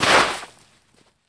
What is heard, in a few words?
Baseball Slide Dirt